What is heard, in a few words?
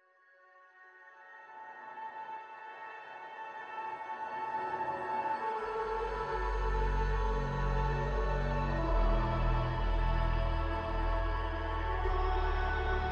Synths and Vocals Only